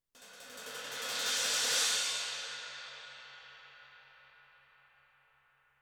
THUNDER BUILD UP

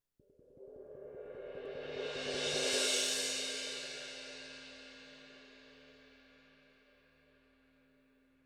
18 CRASH SWELL, cymbals

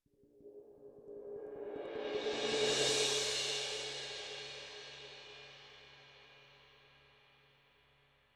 18 CRASH SWELL, cymbals

